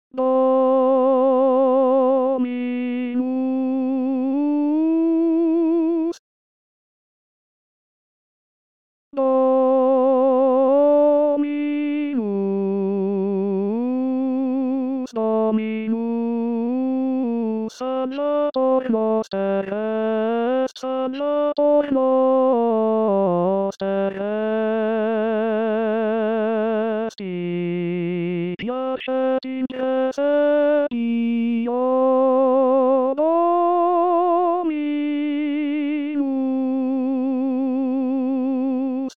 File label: es natus ten; praetorius